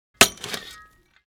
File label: Metal shovel dirt impact